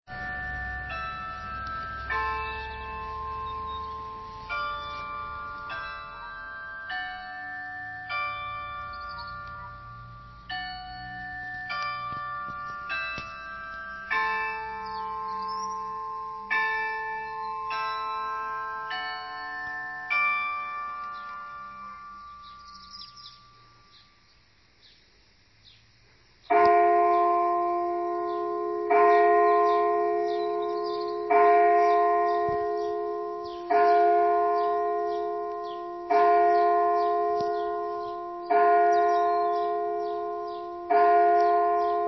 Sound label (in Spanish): reloj iglesia